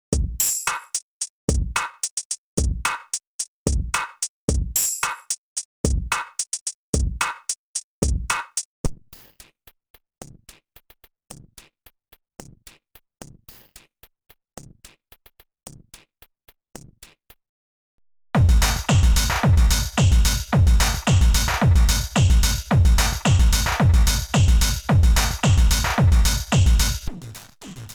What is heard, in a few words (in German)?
Drumloops im Ringmodulator; KNIF AUDIO; KNIFIONIUM